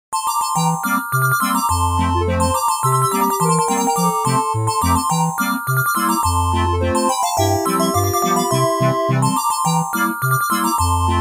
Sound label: Carousel; Yamaha SMAF demo ringtones